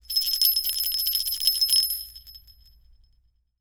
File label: small bell ringing